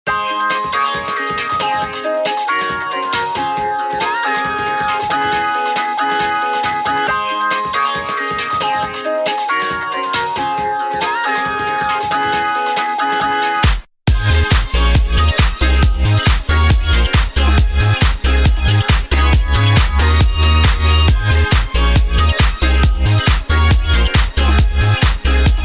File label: groove